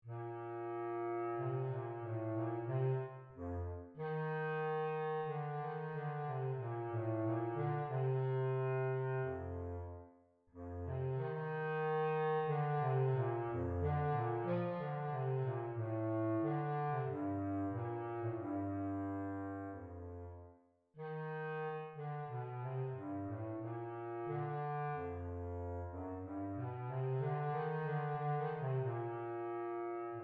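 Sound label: Bass Clarinet Contra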